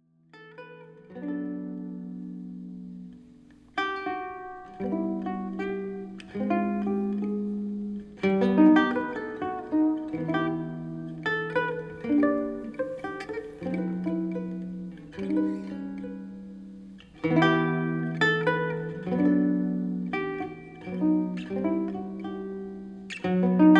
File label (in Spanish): Requinto Jaro